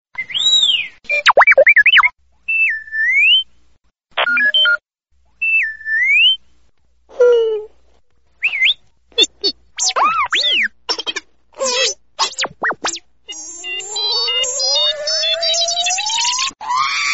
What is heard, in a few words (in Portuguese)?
R2d2 Ouca Me